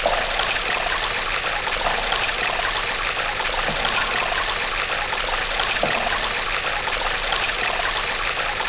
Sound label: sewer